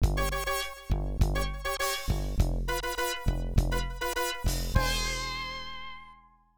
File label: Title Jingle; crayon shin chan orato asobo; arcade